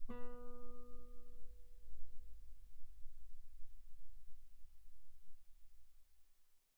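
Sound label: Guitar pianissimo sul B B3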